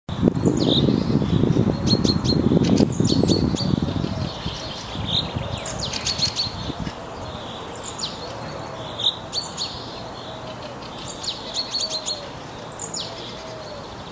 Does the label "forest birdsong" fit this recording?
Yes